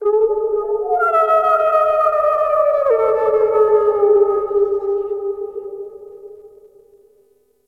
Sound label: Night Distant Coyote; Ambience; Warframe; Eidolon Plains